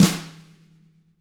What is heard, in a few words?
TAM FLM, DW TAMA, Drums